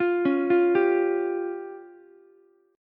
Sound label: F Suspended 2nd Sharp 5th down Ukulele; Fsus2#5 arpeggiated; JGuitar